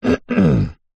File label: human man clear throat